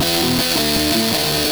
Distorted Guitar Riff; Genesis

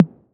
hiphop kit; Wizoo - Platinum 24 Electronic

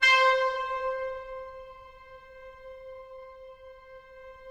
brass C5 R, Yamaha VP-1; OKT.BRASS; Music Store

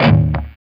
E 3, Soldano rock guitar; Spectrasonics; Hans Zimmer Guitars VOL-1

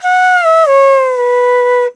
VENU, India Winds; E-MU Producer Series Vol. 7 – Old World Instruments; World Combos

